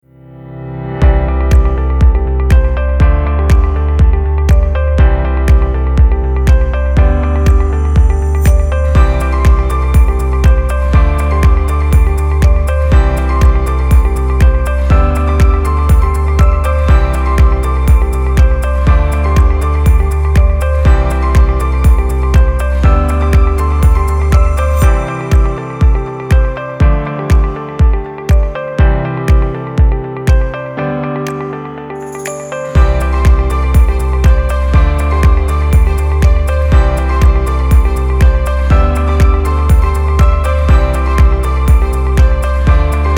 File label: motivational corporate medium